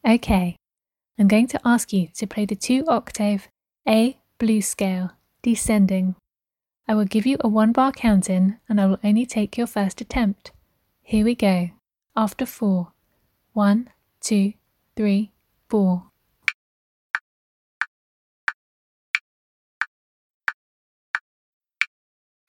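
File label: 2 octave blues descending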